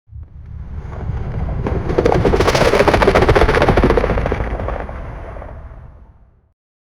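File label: cinematic sound helicopter; 5 sec; vfx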